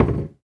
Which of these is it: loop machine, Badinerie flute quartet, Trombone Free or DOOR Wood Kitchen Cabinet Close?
DOOR Wood Kitchen Cabinet Close